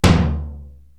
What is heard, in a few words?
Tom Floor, Korg TR-Rack